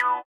sitar, Indiatek; Spectrasonics; Metamorphosis

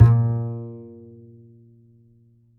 A, Upright Acoustic Bass

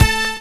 HIT, Hits; Houseworx

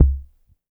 WD, DRM HITS; Spectrasonics; Liquid Grooves; WAVDRM HITS1

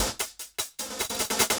LOOP, Drum & Bass, 150 BPM

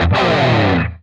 PRS SLIDE, guitar

